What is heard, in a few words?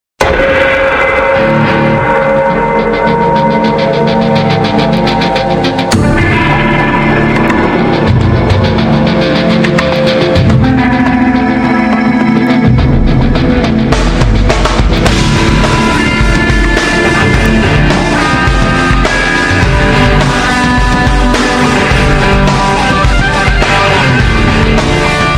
win music; deathlegends